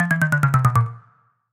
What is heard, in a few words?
video game end